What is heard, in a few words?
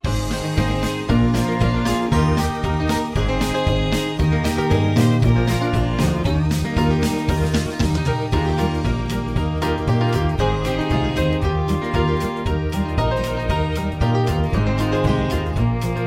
High Key